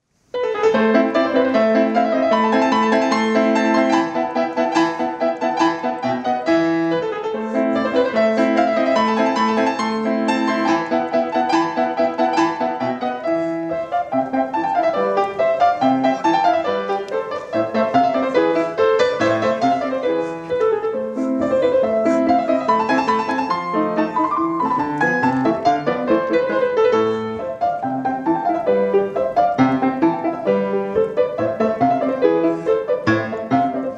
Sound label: Turkish March; Fazil Say; Turk Marsi